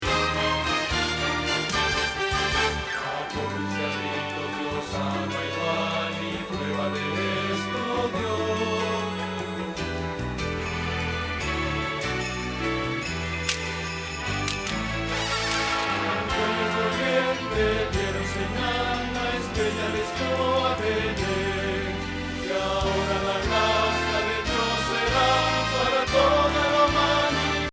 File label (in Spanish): Bajo